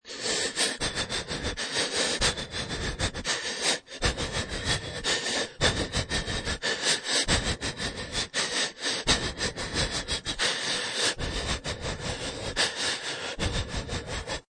Scared Panicky Breathing Faster, Human panicky breathing; scared, short of breath, shaking, nervous, anxious; Scary Breathing; Lynne Music